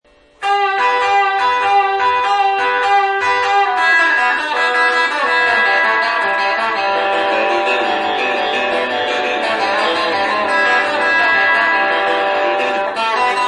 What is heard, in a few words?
tube snake chinese